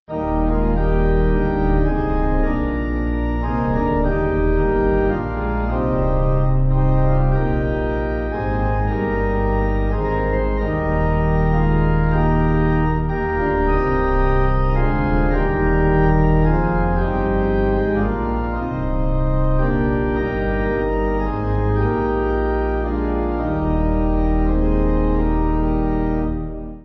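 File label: Pipe Organ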